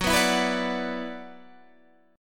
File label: Gb 7th Suspended 4th Sharp 5th, Guitar, Half Step Down